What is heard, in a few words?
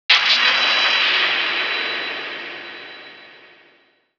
spell shield target; weapons; dota; antimage